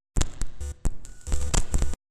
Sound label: loop klicker